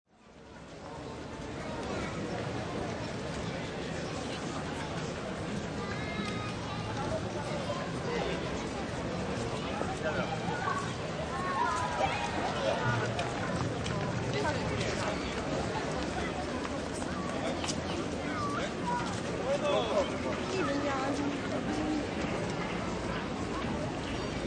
Crowd City Ambience Pedestrian Area, Mid-afternoon; Crowd, City Ambience Pedestrian Area: Mid-afternoon, downtown Warsaw, Old Town pedestrian area. Happy, relaxed, people